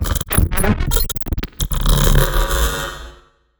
portal glich

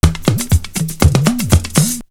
Percussion; Coldcut's Kleptomania VOL1

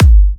G, kicks; markus hakala kicks

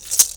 SINGL RATTLE; Best Service; World Colours

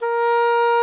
ENGLISH A#4, REEDS; Greytsounds VOL-1

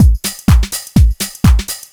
loop 125bpm, House Drum Loops

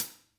HAT; Sonic Reality - Vintage Timetraveler

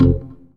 DM ORG1, Dance Mega Synth; Best Service; 90 s Sample CDs

